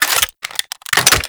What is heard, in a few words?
Reload; Warframe; Grineer; Ogris